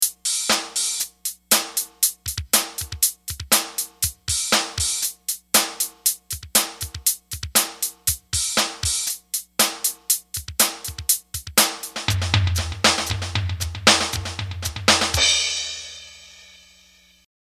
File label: indie rock, grooves